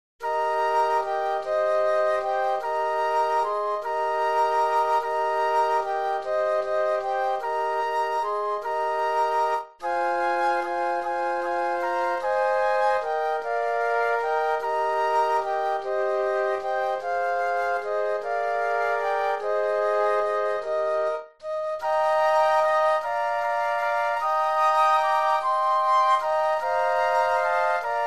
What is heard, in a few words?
Flute Quartet; christmas